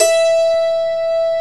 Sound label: DULCIMR KOTO; OMI - Universe of Sounds